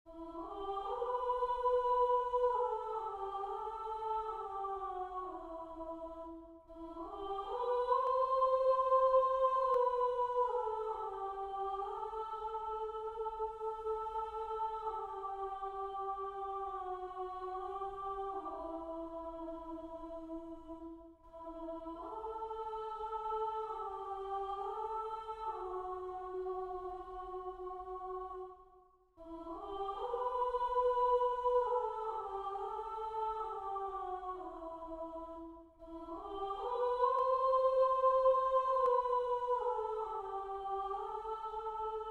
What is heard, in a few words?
Soprano; Wielki Czwartek; spiew na triduum